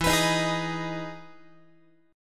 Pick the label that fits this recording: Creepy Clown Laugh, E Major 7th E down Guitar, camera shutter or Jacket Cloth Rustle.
E Major 7th E down Guitar